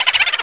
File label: boo; N64; MARIOKART64